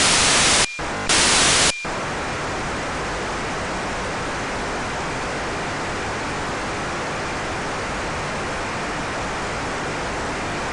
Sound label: DStar Sound